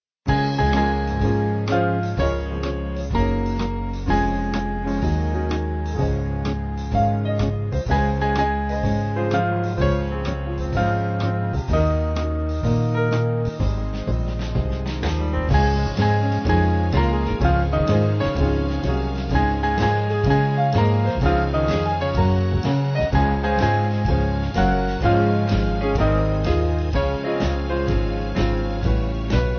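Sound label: Band, Music Backing; What A Mighty God We Serve; 3/Db-D-Eb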